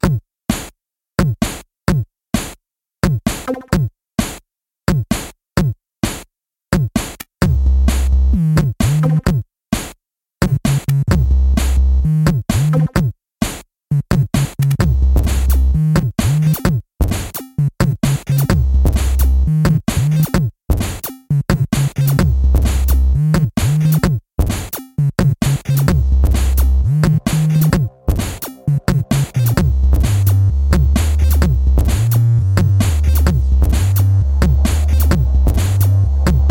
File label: Chip Drums, chipmusic samples; SIR SEDRIC